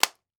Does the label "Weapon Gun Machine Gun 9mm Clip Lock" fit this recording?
Yes